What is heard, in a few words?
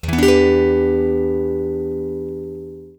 Em7